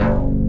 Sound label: HRD PLCK BS, BASSES; Pro-Rec - Synthsonic